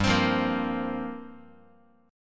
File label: G Minor 11th, Guitar; strummed; x,3,3,3,2,x; 4A,4E,5A,5D,5G#,6C#; JGuitar